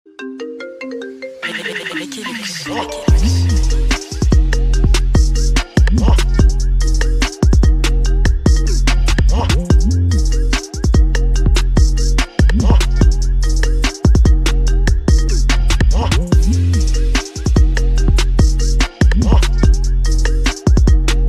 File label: Iphone X Launch Ringtone